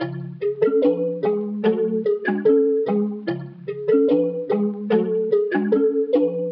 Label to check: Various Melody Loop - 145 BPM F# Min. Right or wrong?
Right